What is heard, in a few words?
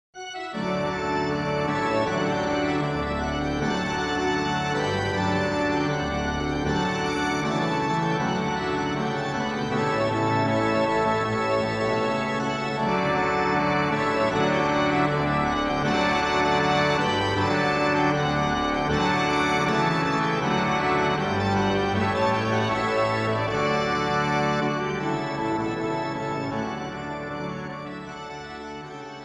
FANFARES; MOURET; svatby